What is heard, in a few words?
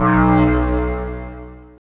psionic shield phase on; Earth and Beyond; EA Games